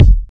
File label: round bass drum sound a key, kickdrum sample tuned to the A note; kick drum samples